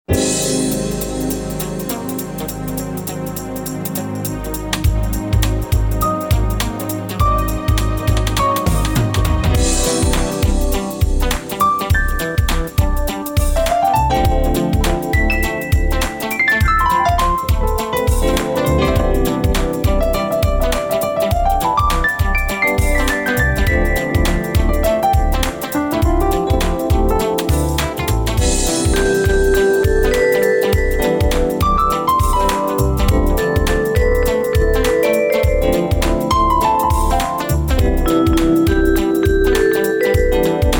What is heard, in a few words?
Smooth Funk Bed